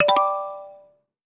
task new; Notifications

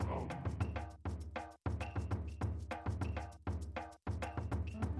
continue; lion-king

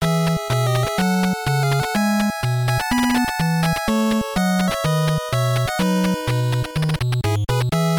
ending staff roll; robocop

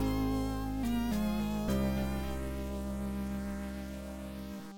death tag; lion-king

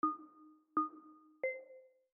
countdown

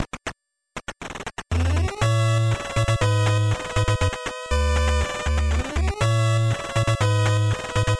ending; die-hard

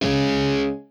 electric guitar

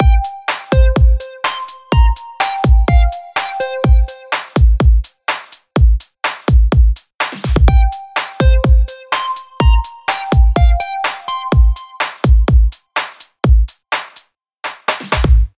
menu; a-saturday-night

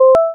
success

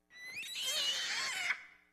monster squeal; silent-hill

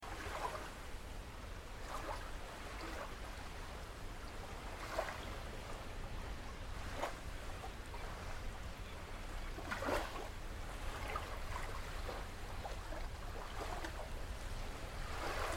dock and water pier underneath ambience; UK dock; 3dstreet

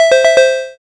complete

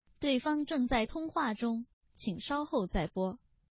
video chat tip On Call

